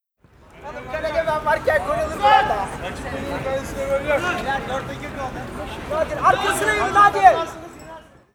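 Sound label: distant vox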